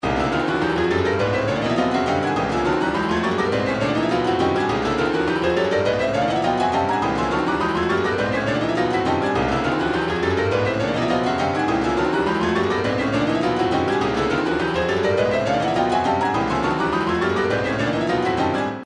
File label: retro funk 103; classy